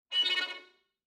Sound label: Violin Shivering Tremolo Short